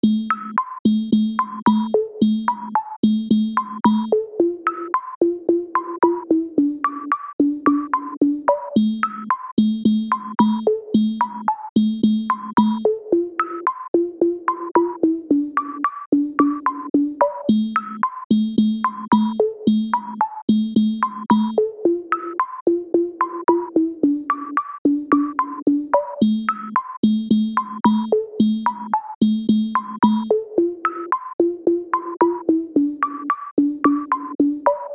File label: synth one shot